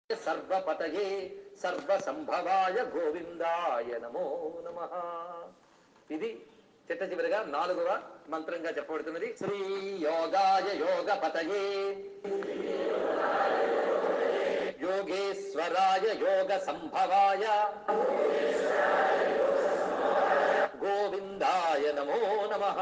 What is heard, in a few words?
Krishna mantram